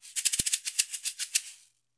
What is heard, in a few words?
GNZ ROL B, Percussion; Sampleheads; NYC Percussionworks; GJ PERC VOL2